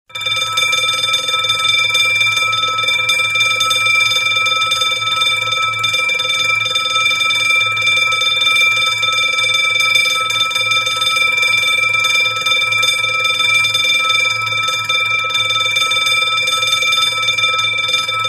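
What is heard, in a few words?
sound of alarm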